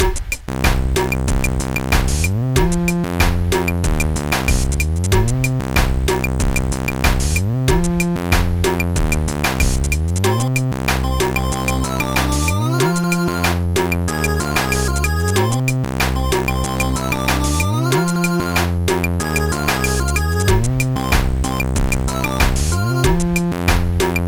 bassline; Trackers; Modland; bassline adult

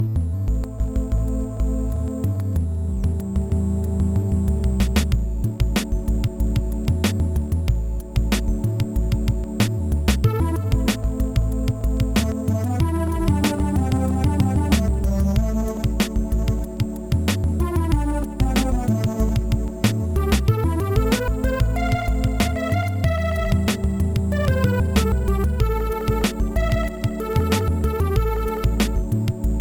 chiptune

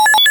shooting range; RoboCop 2

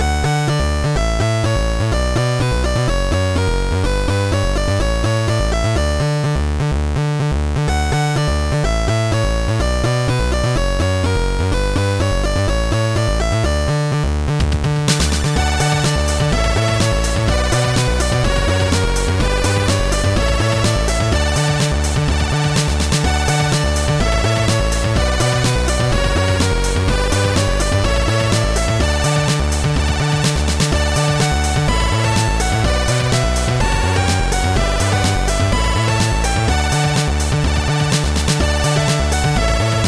chiptune; Trackers; Modland; chiptune no5

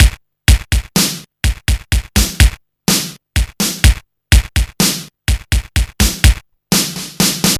drum sequence; Demonoid Productions

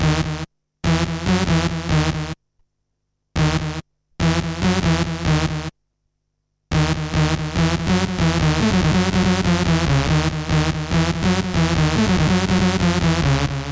expo techno; Trackers; Modland

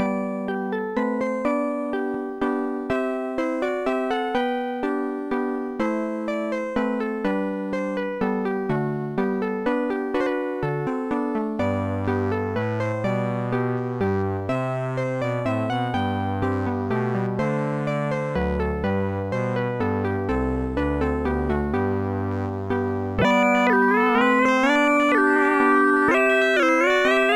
minuet in g; Sonix Music Driver

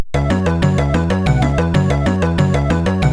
rhythm test; MusicLine

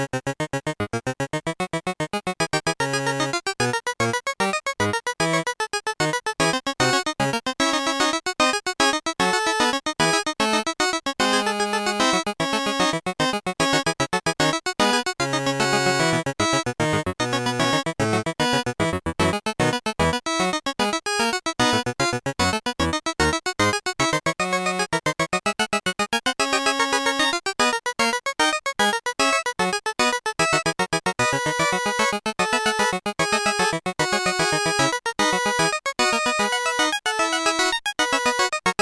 fugue; Deluxe Music Construction Set

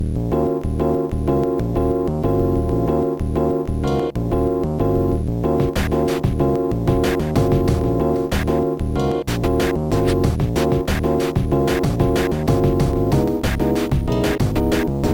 low jazz; Trackers; Modland

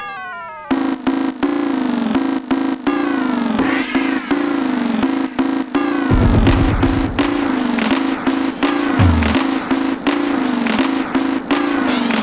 mad house drum song; MusicLine

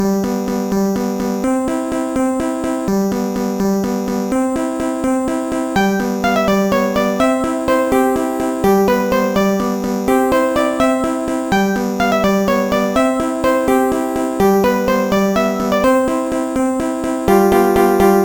piano song; Trackers; Modland; Master Midi

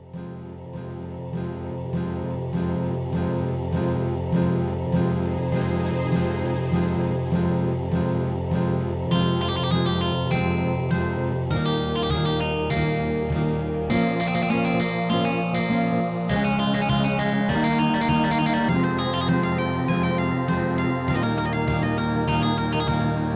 pipes; SoundFX 1.3

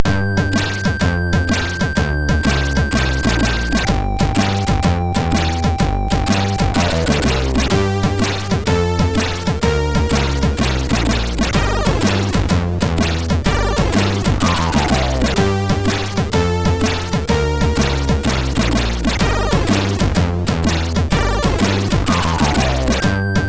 sid MOS6581R2; Contex

